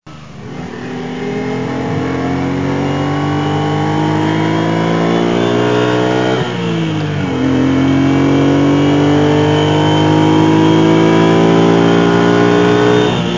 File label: ferrari V6; bruits de moteurs